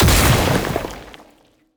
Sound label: Enemy Attack Rock Hit; bloom minigame